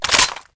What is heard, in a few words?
shotgun close; weapons; kuilu